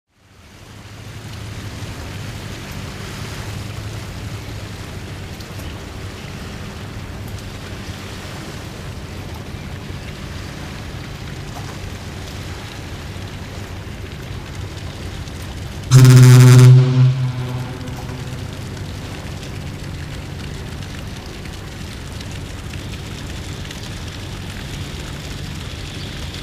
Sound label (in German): Hafen Ankunft Frachter